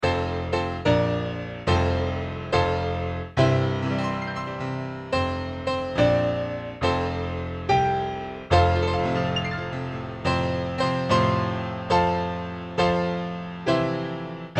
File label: Happy Birthday Style